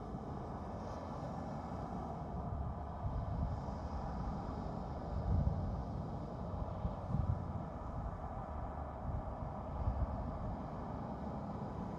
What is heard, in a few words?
Ambience Loop, outdoor